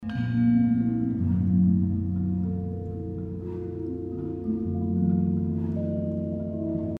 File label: gamelan short